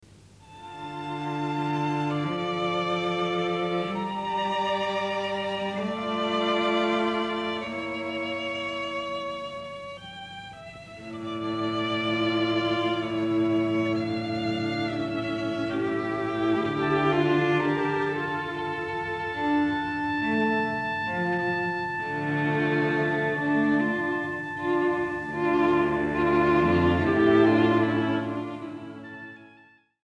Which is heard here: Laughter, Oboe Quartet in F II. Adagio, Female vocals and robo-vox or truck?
Oboe Quartet in F II. Adagio